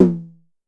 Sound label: Drum X Tom; Pearl Drum-X